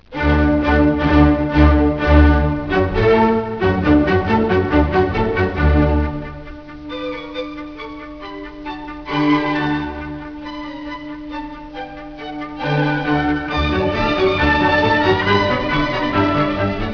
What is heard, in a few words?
mozart violin